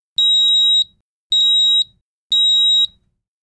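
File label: Fire Alarm Three High; hi-tech-various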